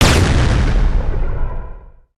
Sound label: pirate cannon fire